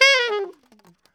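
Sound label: alto short fall C#4, sax; Giga Samples Collection; ALTO SHTFALL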